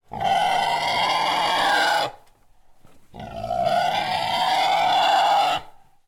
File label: Pig Squeal; Coll Anderson